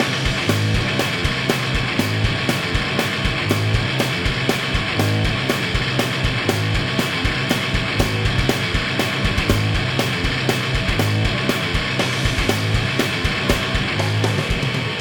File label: Metal Groove